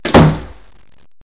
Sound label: door